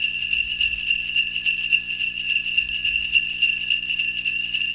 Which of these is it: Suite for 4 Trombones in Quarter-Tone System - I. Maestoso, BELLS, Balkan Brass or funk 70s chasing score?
BELLS